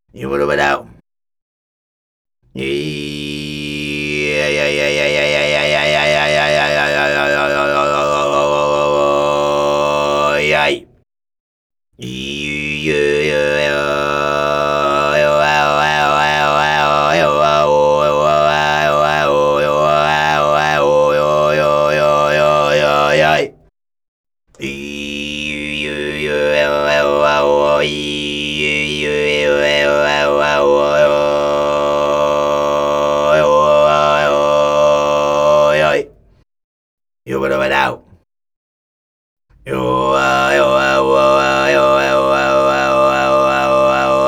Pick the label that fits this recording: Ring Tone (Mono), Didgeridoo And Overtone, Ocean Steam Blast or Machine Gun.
Didgeridoo And Overtone